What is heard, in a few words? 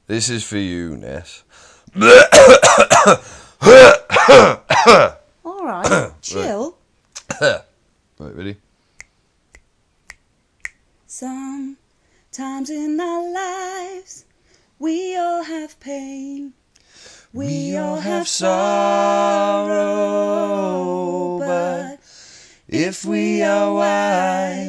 us singing; for the loch ness monster